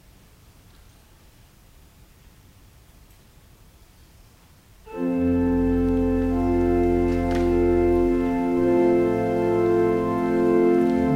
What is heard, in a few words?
Organ Music